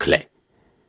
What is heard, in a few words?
Syllables and Imaginary Words, Viking Vocal, Hard